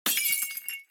glassbreak; ultrakill

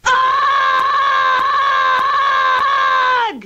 aaugh; charlie brown

